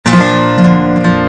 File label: Chord